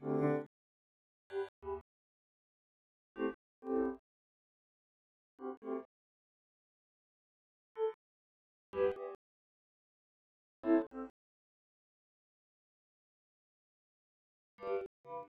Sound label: piano; sound source separation methods; ADRess